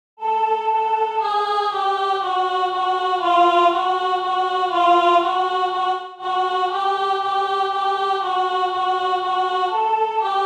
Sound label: Campana Alt, Canto popolare abruzzese; Italien